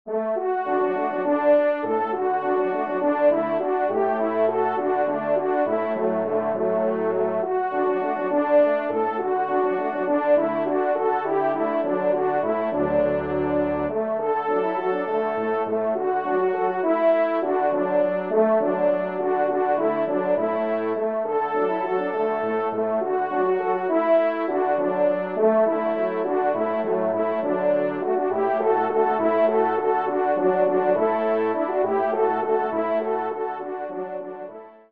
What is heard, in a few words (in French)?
Valse, Do Maj, trompe